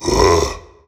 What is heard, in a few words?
growl; floating knight